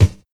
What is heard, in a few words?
mid heavy kick sound f sharp key, Royality free bass drum sound tuned to the F# note